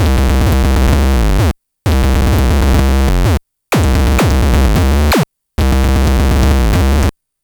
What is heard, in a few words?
LSDJ Wonkin' Grummel 129BpM, Nintendo Gameboy Loops; maurice.rebell Loops